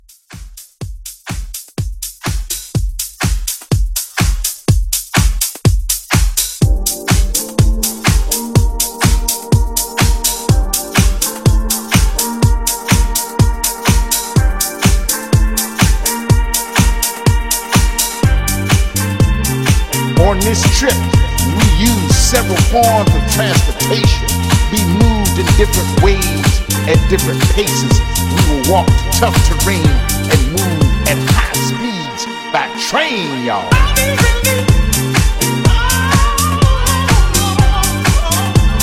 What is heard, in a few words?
Disco Club